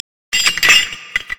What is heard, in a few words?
brazier chains